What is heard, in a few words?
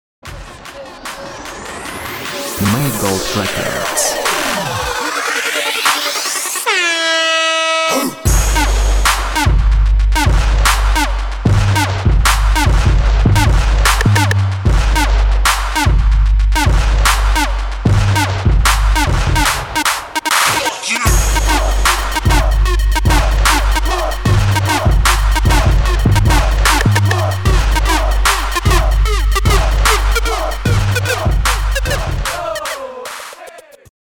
Bassfreak